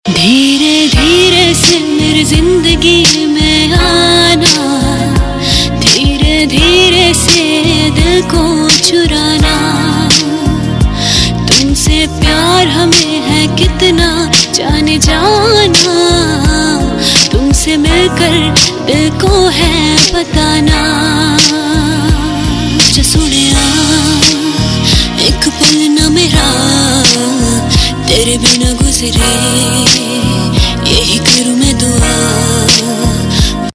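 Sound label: Mashup Cover Ringtone, Punjabi Pop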